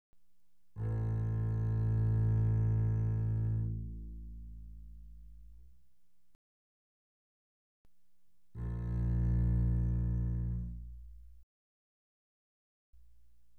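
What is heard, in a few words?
Bass arco mezzo forte sul A A1 B1, doublebass; Strings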